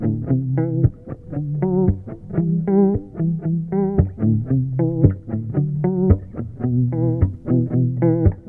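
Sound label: riff em 113bpm processed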